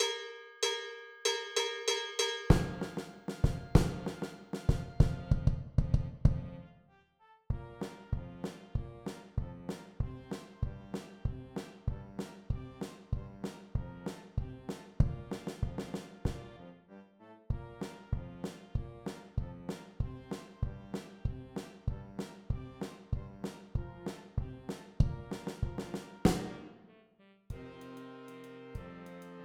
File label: perc